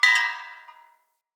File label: Bell Swing Short; Enemy; Corrupted Sproutfolk; Foley